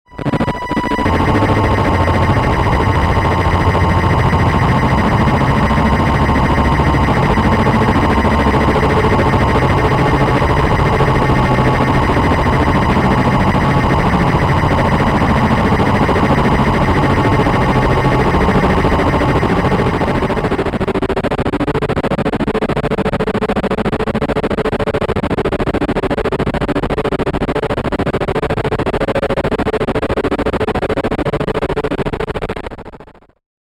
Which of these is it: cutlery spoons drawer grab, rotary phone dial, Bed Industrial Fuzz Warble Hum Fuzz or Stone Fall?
Bed Industrial Fuzz Warble Hum Fuzz